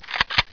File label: sl8 draw, weapons